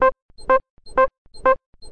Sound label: 125 bpm, lo squeaky beep, drum loops and breaks